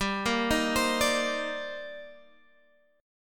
C Minor 9th G down Guitar Standard

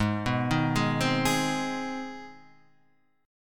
C Minor Major 7th Sharp 5th Ab, chord

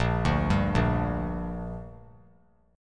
A sharp 6th; arpeggiated; JGuitar

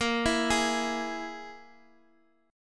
E Major Flat 5th

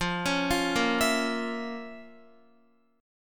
F Major 7th Suspended 4th Sharp 5th F, Guitar; arpeggiated

